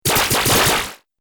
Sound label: Laser Fast Shots, Laser Gun; Laser Gun Sound Effects